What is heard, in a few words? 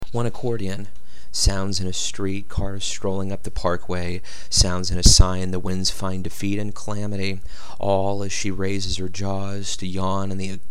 accordian; accordion